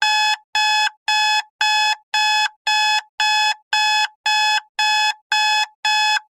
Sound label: alarmclock alarm